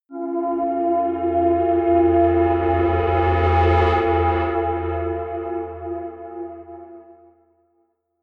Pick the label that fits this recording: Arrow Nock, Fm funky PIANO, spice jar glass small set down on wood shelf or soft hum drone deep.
soft hum drone deep